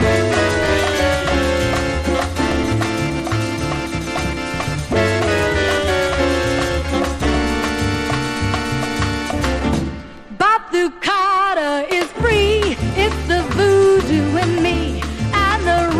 BATUCADA

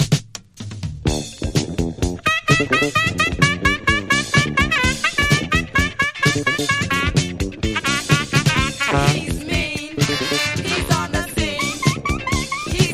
SAX

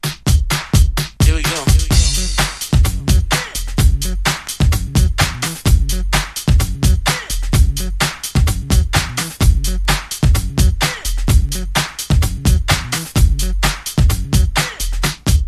VOCAL, breakbeats

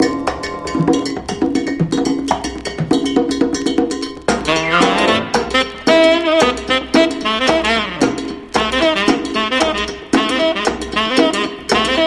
SAX GONE LATIN

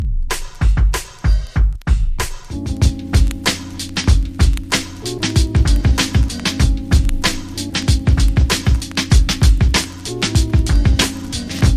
INSTRUMENTAL

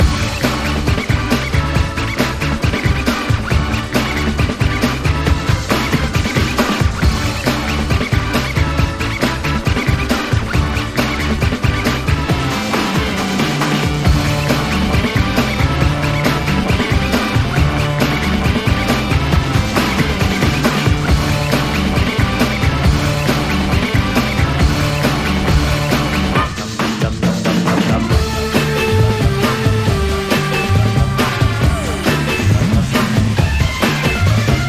groovy organ instrumental; JPN; OLIVE DISK & RECORDS; MOUNTAIN ROAR PRODUCTIONS

